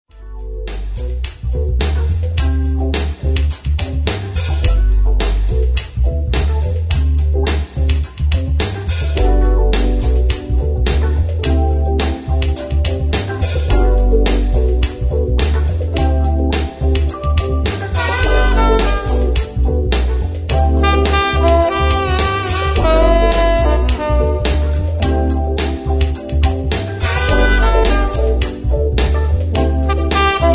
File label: Jazz Band